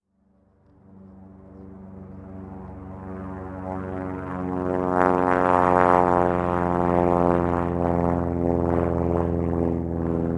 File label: plane; combat atmosphere; World of Tanks Blitz